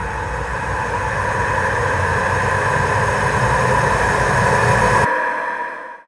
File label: dark rift target; underlord